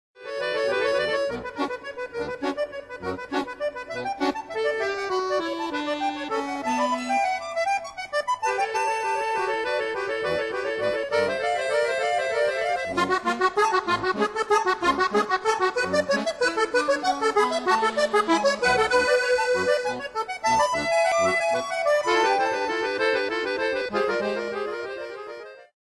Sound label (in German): Akkordeon